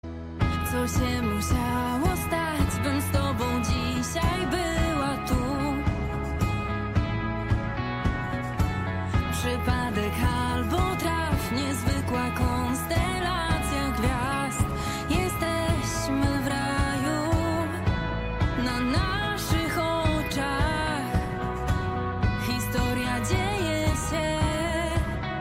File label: ringtone